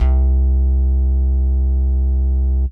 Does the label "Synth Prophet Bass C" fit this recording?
Yes